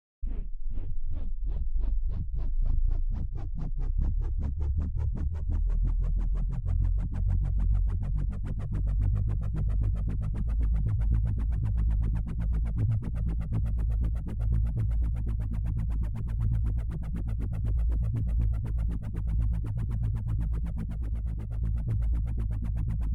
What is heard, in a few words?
Sci Fi Engine Starting Up